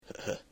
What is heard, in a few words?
hehe